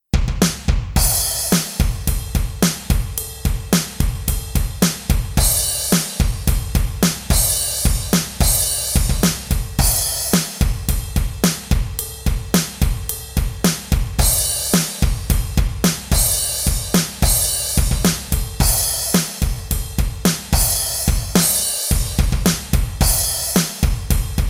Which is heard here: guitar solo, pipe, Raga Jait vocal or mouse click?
guitar solo